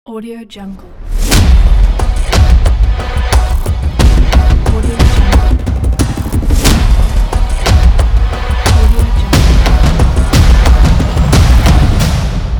aggressor ident

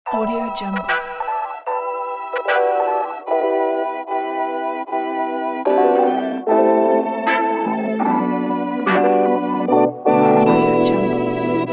hip hop

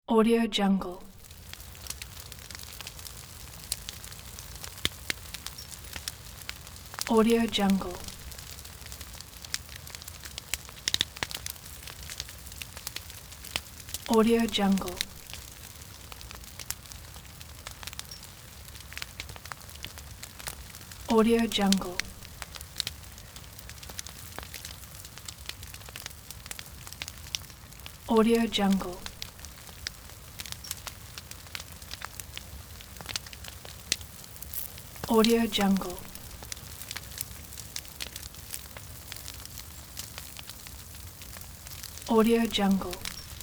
bonfire

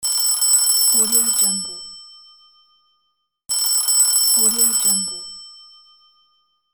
old phone ringing

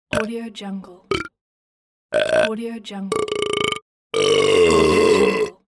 burps or belches

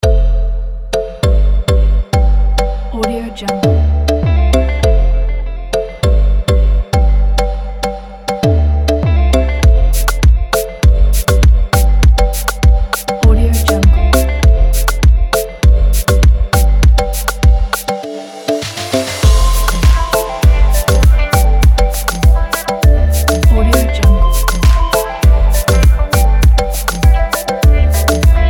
summer afrobeat pop

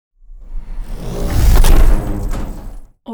robotic lifeforms whooshes mecha step sweetner; robotic lifeforms 2; soundmorph

